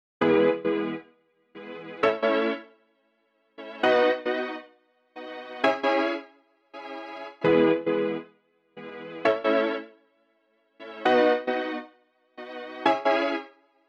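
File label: kick driven synth sidechain, noise gate; e noisegate600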